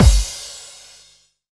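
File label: bass drum FX, dance drums; Best Service Dance Mega Drums; BD-FX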